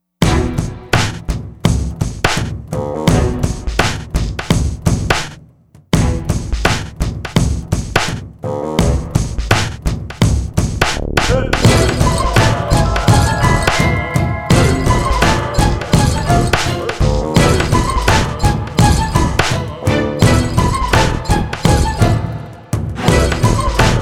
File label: Howl